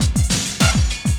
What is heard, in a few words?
loop, 200 BPM; Ueberschall; Drum & Bass Resonance